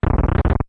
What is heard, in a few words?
Link Push; LTTP; Link pushing a heavy object (also pulling a lever and Armos or Stal [skull rock] coming to life